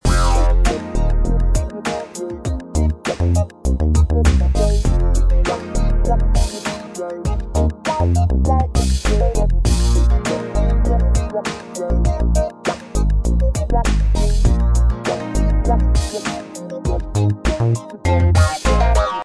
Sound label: electro